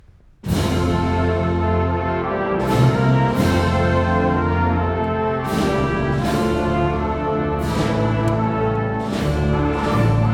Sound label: Ukranian National Anthem